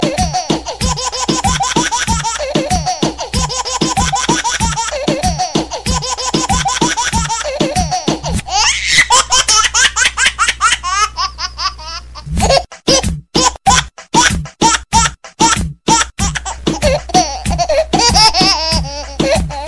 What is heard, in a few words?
Baby Rap